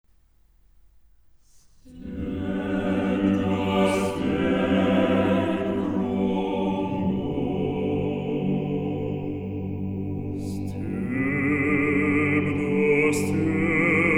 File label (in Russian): Хор